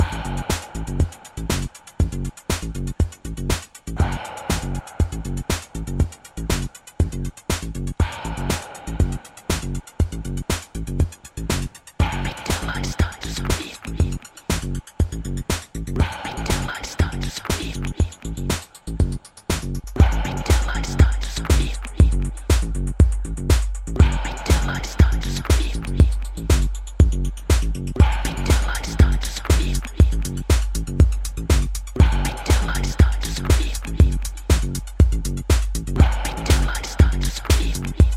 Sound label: Scream